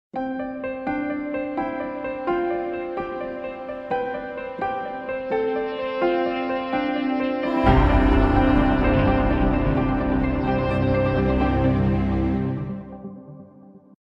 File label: Piano morning